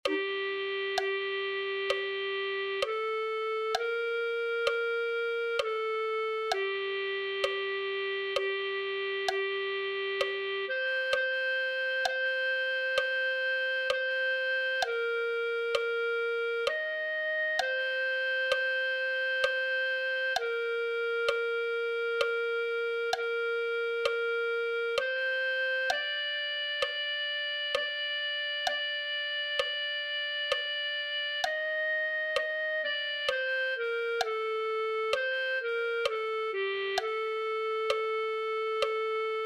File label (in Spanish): clarinete